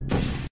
portal gun shoot

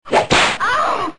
whipping noise

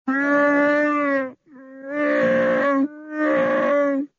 roar; chewie

